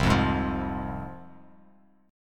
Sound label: C Suspended 4th Sharp 5th, C, down, Bass Standard; Csus4#5 strummed; JGuitar